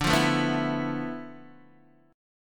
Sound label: D Minor 6th Add 9th D, Guitar, DADGAD